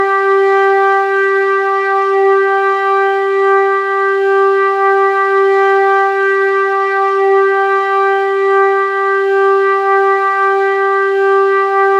Flute g3; Bodies

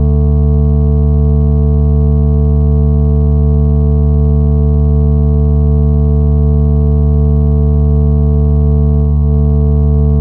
haptic buzz loop; quake

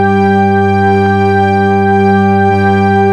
Individual Notes Chord; color vision

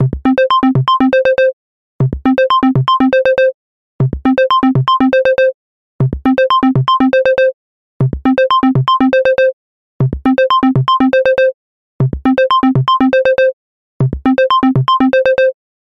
Low Punctual Boops; quad Track

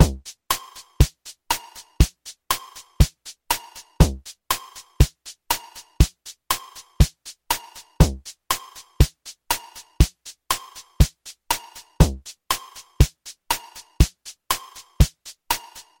Background Rhythm Simple

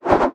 share Whoosh Sound; mean share and balance

